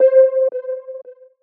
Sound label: PLUCK (C), Pedal One Shots; Weather; Cymatics; ANALOG EVOLUTION Beta